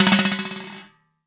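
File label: timbales roll; AKAI S-Series CD-ROM Sound Library VOL-5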